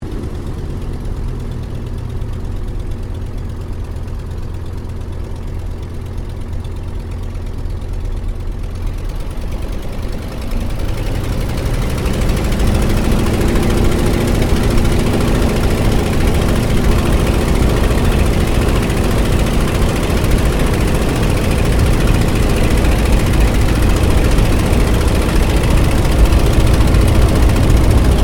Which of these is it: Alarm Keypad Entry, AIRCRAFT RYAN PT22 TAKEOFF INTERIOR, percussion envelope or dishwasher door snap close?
AIRCRAFT RYAN PT22 TAKEOFF INTERIOR